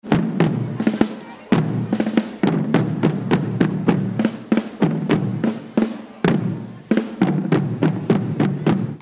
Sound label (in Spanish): Toque Tambor; Ecce Homo